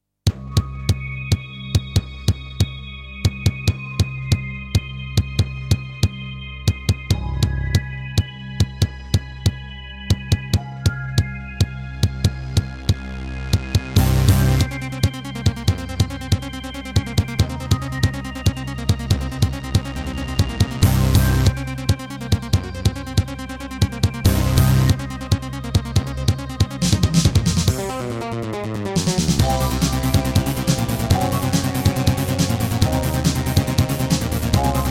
Serdaco Dreamblaster S1; sigil doom episode 5

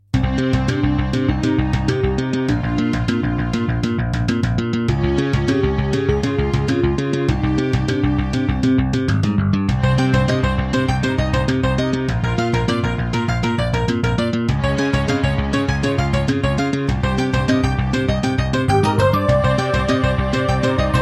Creative Wave Blaster; Hocus Pocus

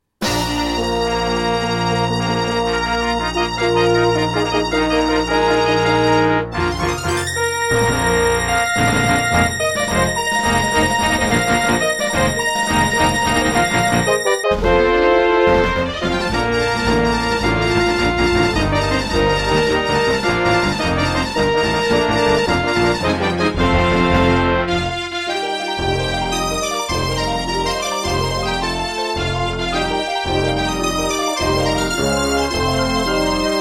Intro, Procomp Pro-Multimedia; Dark Forces

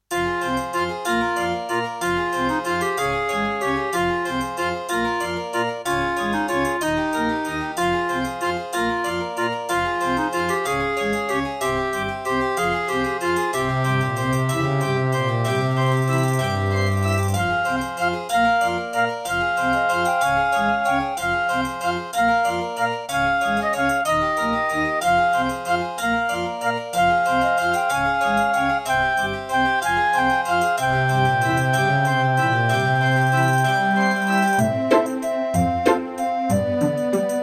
Roland CM300; Death Gate

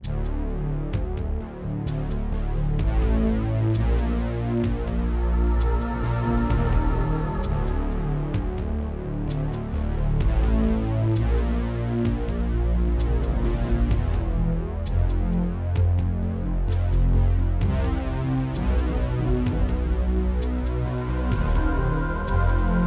Casio CTK-800; Descent II

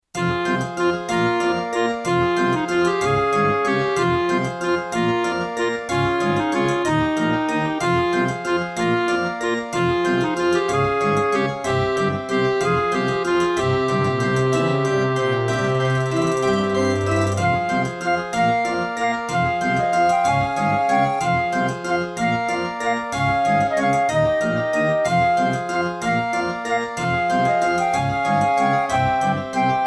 Korg NS5R; Death Gate

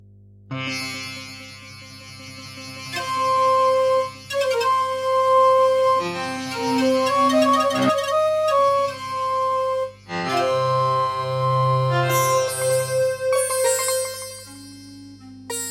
Creative Wave Blaster; Prince of Persia 2 - Intro

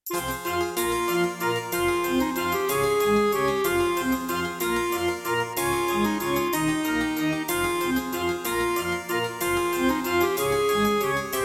Roland JV-1010; Death Gate

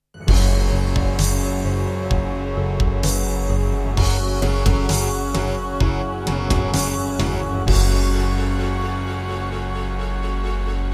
Yamaha TG100; Doom (Sigil) - Introduction

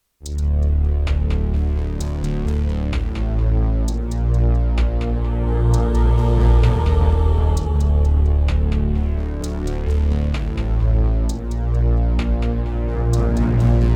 Serdaco Dreamblaster X16GS NATIVE; Descent II - Briefing

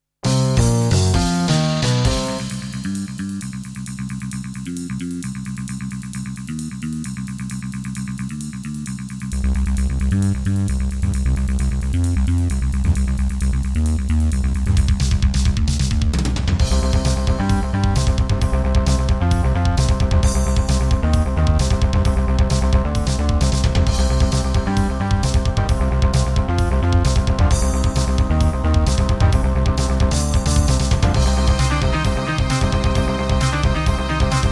Yamaha TG100; sigil doom episode 5

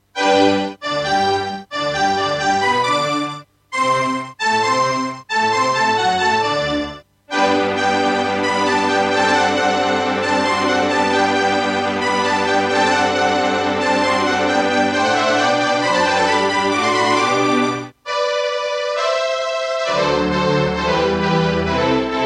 Diamond Monster Sound 0.5MB; classical; Mozart; Eine Kleine Nachtmusik partial

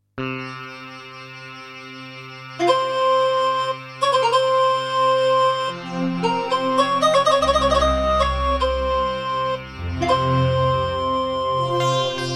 Terratec Wave XTable; Prince of Persia 2

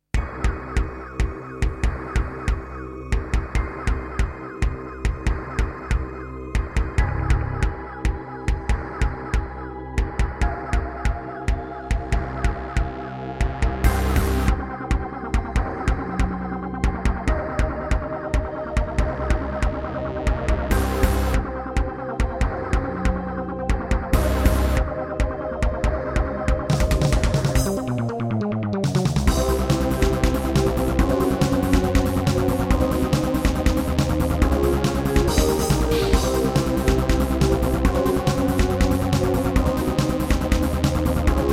Yamaha DB51XG GS MODE; sigil doom episode 5

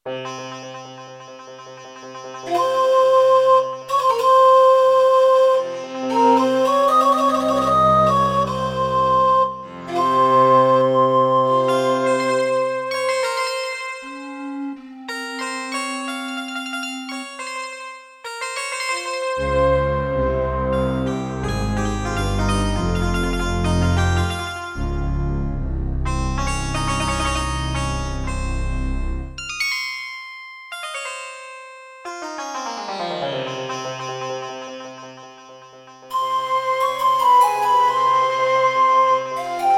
Intro, Roland SC-88 Pro; Prince of Persia 2 - Intro

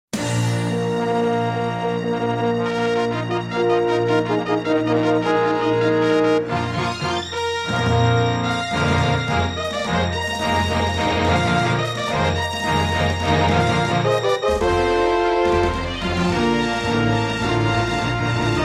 Intro, Korg 05RW; Dark Forces

